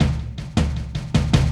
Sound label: Wasteland Loop Break